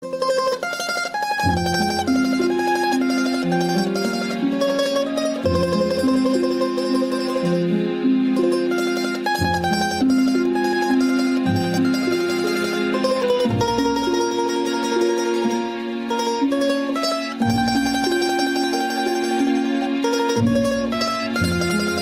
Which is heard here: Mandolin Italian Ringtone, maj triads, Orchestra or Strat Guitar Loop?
Mandolin Italian Ringtone